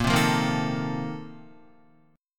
A sharp Major 7th Suspended 2nd Suspended 4th A sharp Guitar; A#M7sus2sus4; strummed; JGuitar